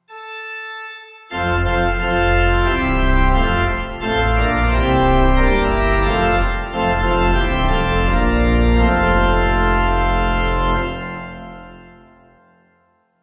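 Morning Service Triple Hallelujah Pipe organ, Lutheran; Lutheran - 1941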